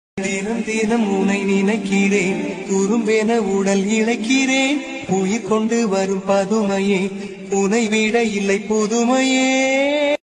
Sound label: emotion bgm love music; kadhalar dhinam